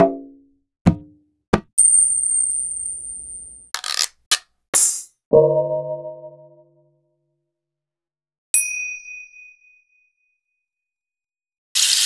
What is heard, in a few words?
Roland BK-5 Percussion